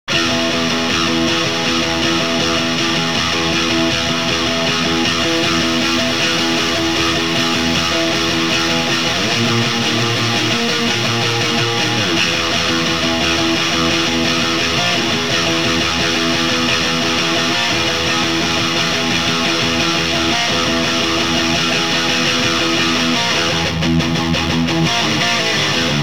guitar; multitrack

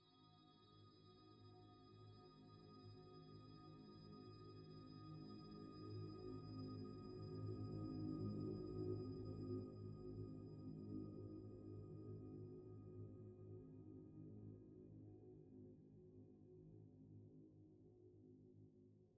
synth

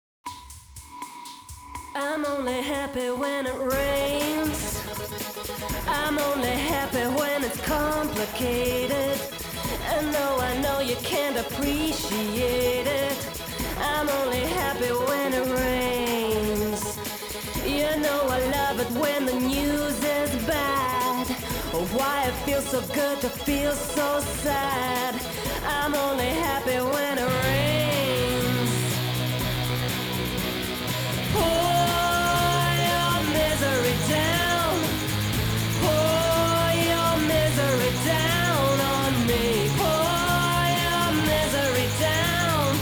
Vocals + Additional Instruments

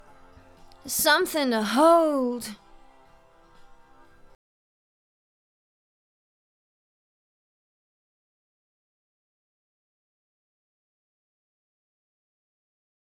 Adlibs

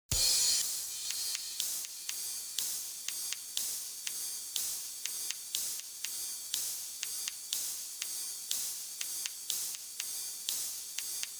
Cymbal

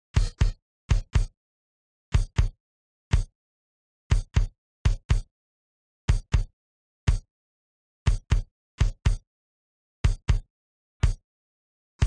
Bass Drum

